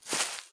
footsteps grass Walk; SFX Characters Player PlayerWalk; Poppy Playtime

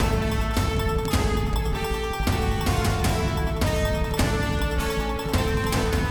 Canyon Combat; Ben 10; Ben 10 Canyon Combat MIXED pt07

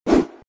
Sfx Barbarian Attack; Mighty Knight 2

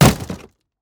plastic break; teardown; ps5 windows xbox series xs

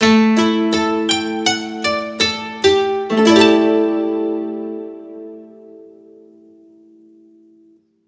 gameover; ukiyo no roushi ps vita gamerip 2015

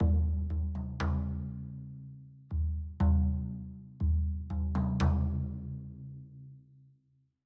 Drums; Frozen Flame; Citadel Music Drums

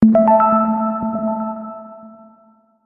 Stage Warp; Tower of the Approval

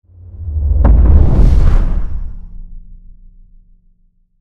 Goddess Wind; Goddess of Trampling

